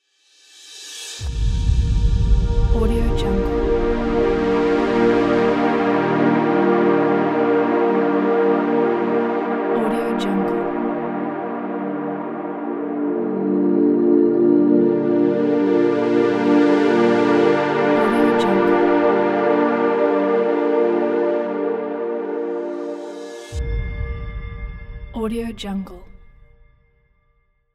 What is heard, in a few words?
cinematic calm intro